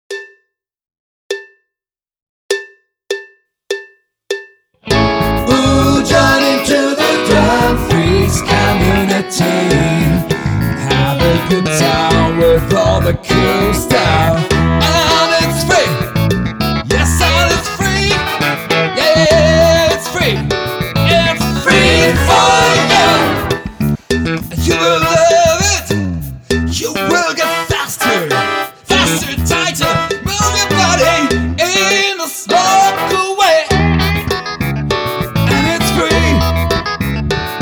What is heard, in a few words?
Drumpart Playback 100 BPM